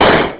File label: bang; MiniSpeak Special FX